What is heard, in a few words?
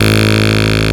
PPG 2.3; DIGITAL1 HYB